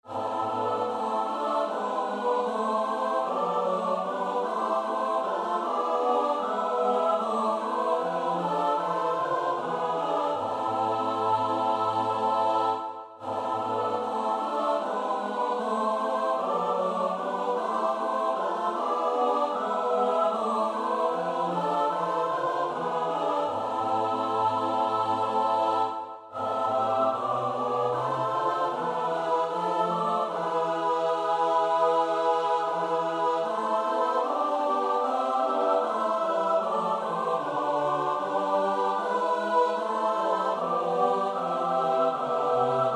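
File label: soprano alto tenor bass; Bach (Johann Sebastiaan); BWV 183; Du bist ein Geist, der lehret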